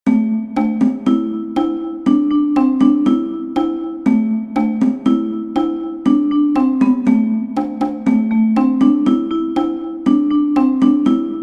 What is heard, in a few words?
Cajon; Jimba, jimba